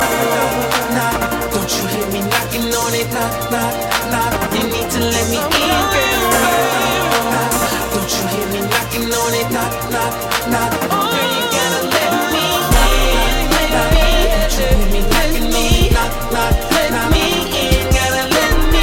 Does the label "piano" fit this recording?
No